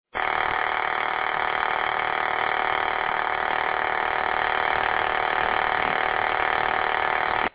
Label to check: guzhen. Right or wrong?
Wrong